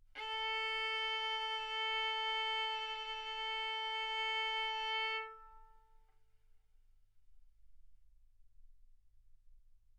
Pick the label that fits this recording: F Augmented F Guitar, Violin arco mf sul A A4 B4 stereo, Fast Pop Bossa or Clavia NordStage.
Violin arco mf sul A A4 B4 stereo